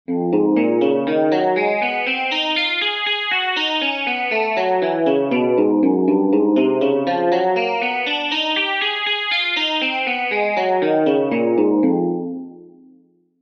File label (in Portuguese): Exercícios Guitarra 60 bpm